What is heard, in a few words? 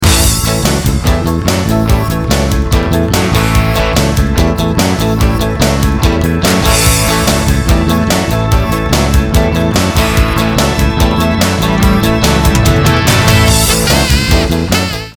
rock